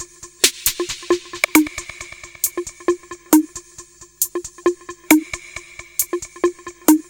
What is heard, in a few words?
Drums Techno